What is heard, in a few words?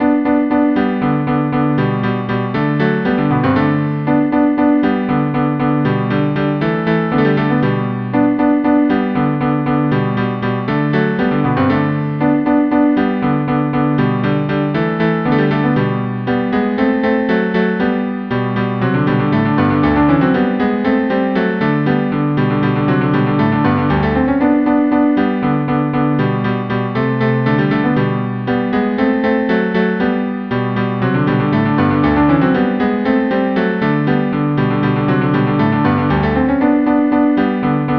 cello duo; Rossini; 5 duos